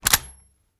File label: ar reload empty fast bolt release, weapons; gmod; viper